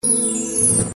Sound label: win loop; Brazil; slottiger